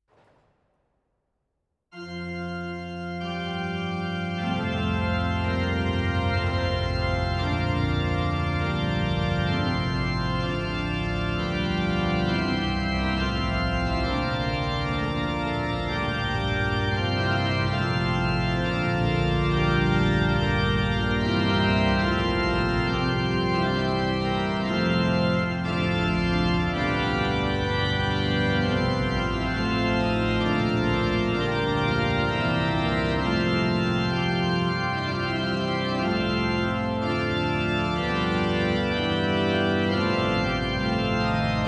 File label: Improvisation Plenum; Isnard; Maximin